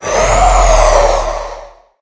idle, wither; minecraft; mob

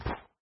snow, step

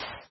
sand, step

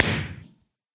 blast, fireworks; ayuncraft-1.5

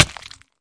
hurt flesh, damage; ayuncraft-1.5

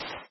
sand, step; peytonplayz585-a1.2.6

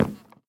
wood, step; ayuncraft-1.5

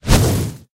fireball, ghast; ayuncraft-1.5

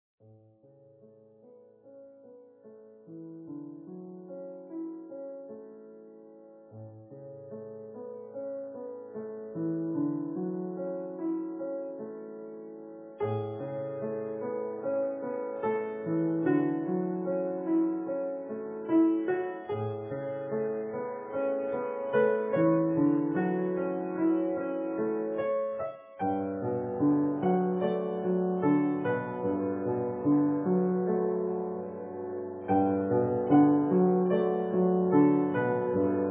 piano

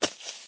say, creeper; minecraft

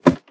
ladder, step; minecraft

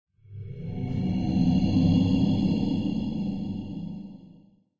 cave, ambient; minecraft

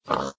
say, pig; minecraft; mob

